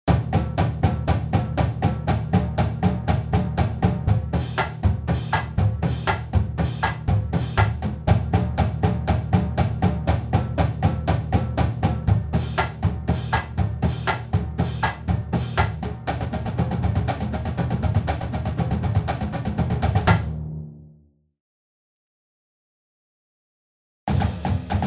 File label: 816 Crush, drumline cadences